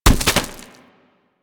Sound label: Bird Of Prey Bodyfall Dirt; Warframe; Hunting Critters